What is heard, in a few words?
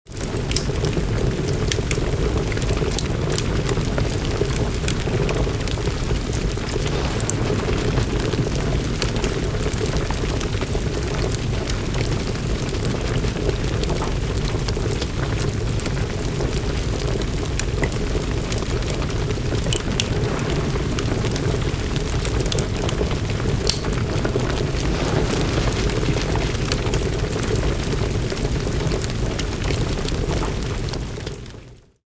loading